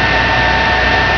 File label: Hum Turbine